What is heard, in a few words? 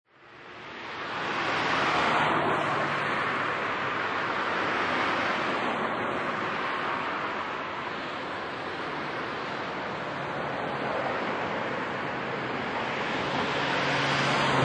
Medium To Heavy Night Traffic, Cars driving by at night; Cars driving by at night time, no people in the streets. Smooth traffic sound.